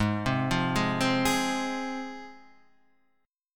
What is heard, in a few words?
G sharp 6th Guitar, chord; arpeggiated; Standard; JGuitar